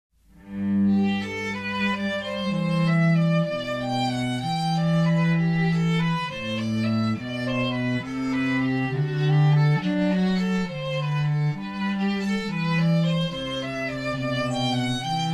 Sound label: Viol Cello Cantata; Bach